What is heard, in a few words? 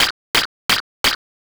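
footsteps sand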